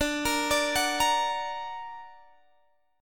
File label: D Augmented D down Guitar Open G